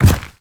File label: step soft, zombie blind; Half Life Alyx; creatures